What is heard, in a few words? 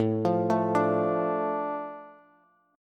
A Suspended 4th Sharp 5th, A, Bass; Asus4#5 arpeggiated; JGuitar